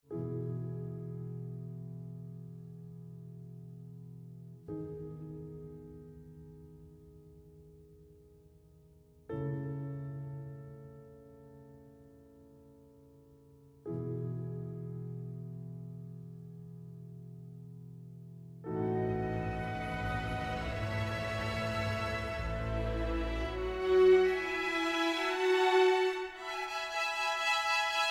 Epic Movie Trailer

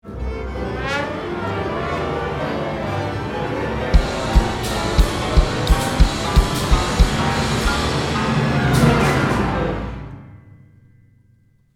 Crazy Contemporary Crescendo

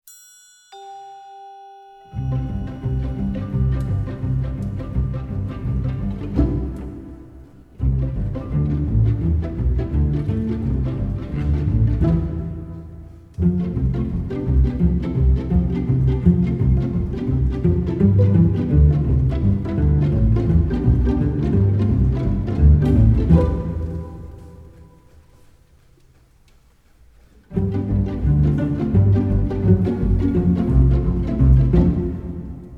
Pizzicato Mischief

